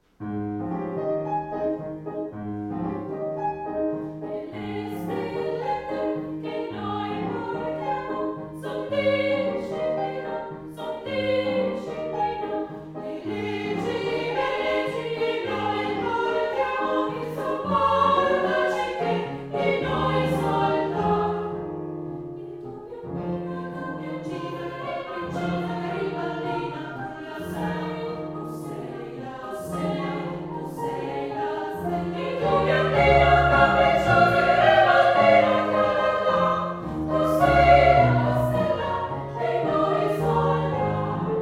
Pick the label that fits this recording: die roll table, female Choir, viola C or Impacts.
female Choir